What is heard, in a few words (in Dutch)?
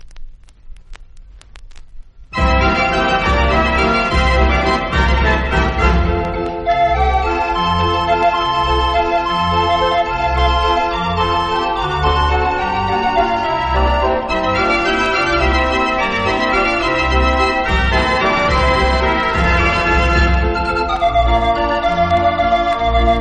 draaiorgel; de zeventiger